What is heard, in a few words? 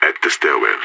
stairwell; IDF